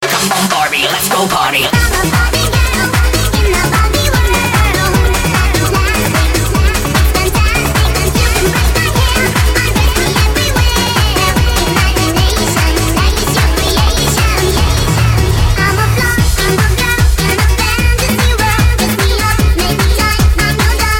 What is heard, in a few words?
echo ringtone